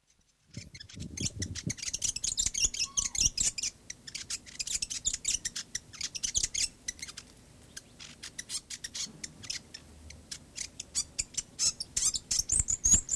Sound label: fantail; conservation, native animals, birds, bird song